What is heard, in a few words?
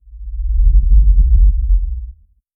low ominous hu, awe